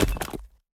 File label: Pick Up Stone